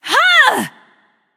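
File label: nonverbal battlecry; smite; voicepack; izanami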